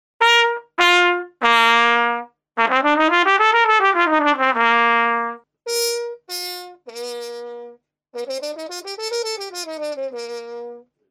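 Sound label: trumpet mute practice plastic; faxx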